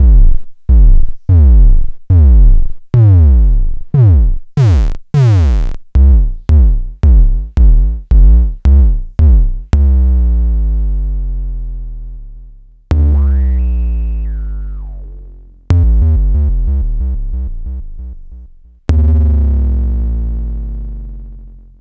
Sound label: Dual Percussion Synthesiser BASS; Klone Dual-Percussion-Synthesiser KLONE BASS